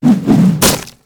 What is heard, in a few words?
Axe, Tomahawk, Throw Whoosh With Impact; gun and weapon sounds; captain audio; fully loaded